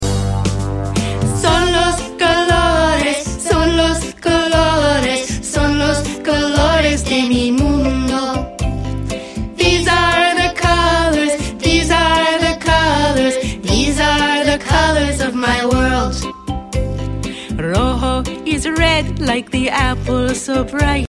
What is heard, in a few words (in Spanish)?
son